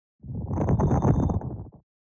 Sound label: Sliding Stone